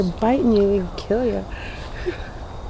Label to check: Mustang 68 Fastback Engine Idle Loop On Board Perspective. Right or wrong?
Wrong